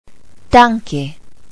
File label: tank